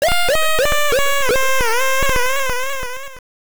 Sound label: Lose Life; tiny toon adventures 2 montana s movie madness; gb